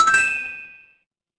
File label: ui chime